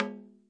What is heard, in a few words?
daibyoshi B2, Japanese Drums; ETHNIC and WORLD PERCUSSION